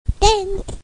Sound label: dance